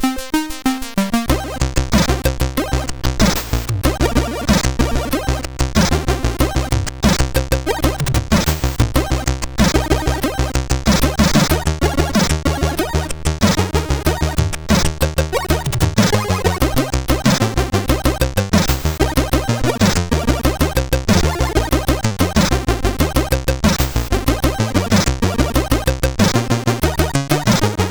sid CSG8580R5

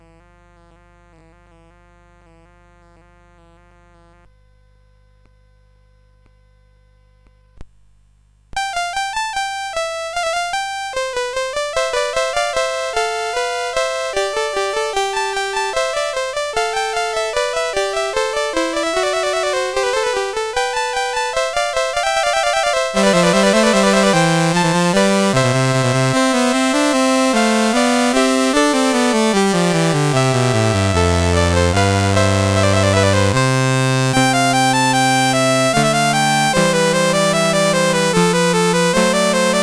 Trumpet Tune; CSG8580R5

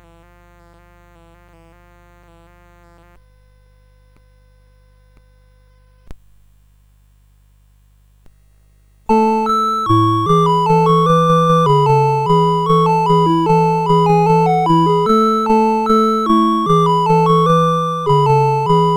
CSG8580; CSG8580R5

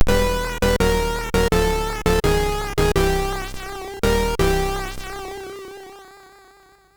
Lights On, MOS6581R3

